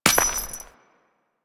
Step Dirt, footsteps

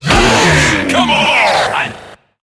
Infested Lich Hard Drive Pain

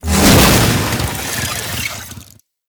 Infested Hammer Whoosh; Weapons

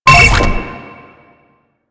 Proto Infested Mutalist Big Land Scream; Warframe; Abilities

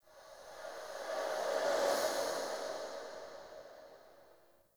Fey Eldveil Latent Random, Powers

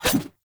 Large Dagger Whoosh Heavy; Weapons; Enemy; Daggers

